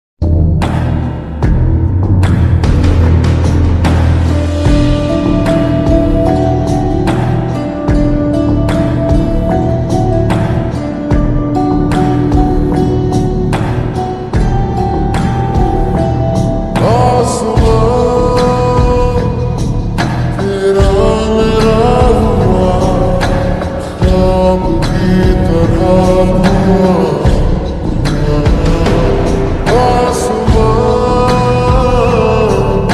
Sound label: slowed reverb ringtone, beats